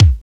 KIK MACHIN, Kicks; AMG; Now CD-ROM (Roland); NOW! Drums

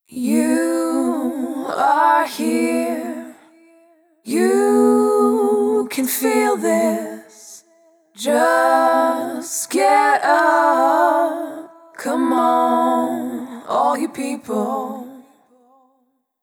Multi Voice Loops, Vocal Samples; Sonic Foundry (Sony Creative Software) - Pocket Diva World Class Vocal Samples

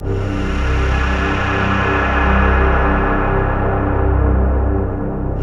AMB REPEAT; Synclavier Sampler Library; KEYS AND GUITARS

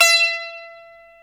SITAR, Plucks; Eth Plucks, Pluck Duo

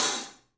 3D Percussives, Studio Kit; E-MU Producer Series Vol. 5 – 3-D Audio Collection

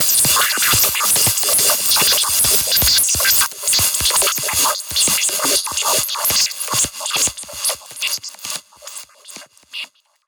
Modu Proc B 140, alarm siren samples, 140bpm; musicradar

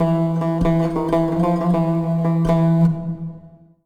SIERRA GUI; Best Service Advanced Media Trax Building Blocks

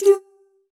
Jazz Doos Short; Roland BK-5; Loops & Phrases

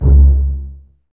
BSS C#2 Pizz, String Ensembles Basses; Miroslav Vitous

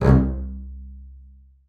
MARC C2, BASS SPICC; Xsample VOL-5

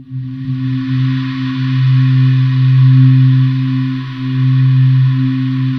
DEEEEEP C3, Choir; USB Soundscan vol.28 - Choir Acoustic & Synth; AKAI

